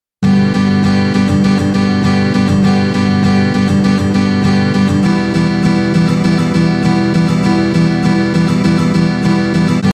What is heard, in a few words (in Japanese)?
16ビート, ギター; 16ビート③; ギター講師が教える初心者用16ビートストローク